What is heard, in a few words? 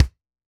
Tight Kick